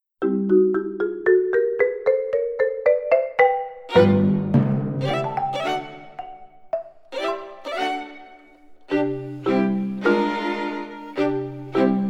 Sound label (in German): Froschwippe PLAYBACK; Lektion3